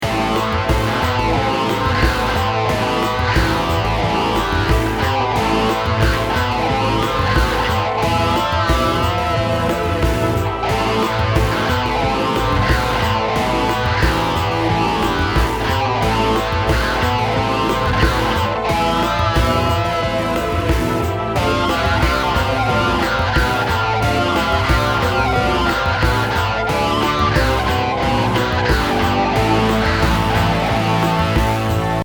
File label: in another room Loopable